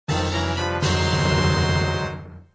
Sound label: Item Catch; Zelda